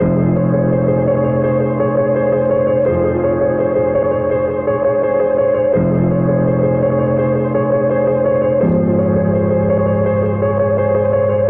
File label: piano, Sad